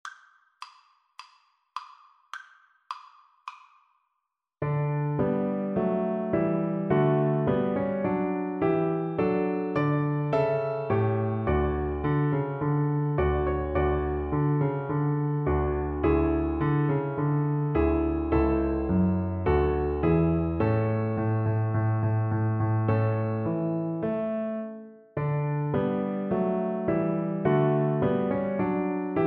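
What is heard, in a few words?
march in d VLC karaoke, Cello; bach; bwv anh 122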